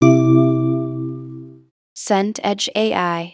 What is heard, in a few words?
notify gentle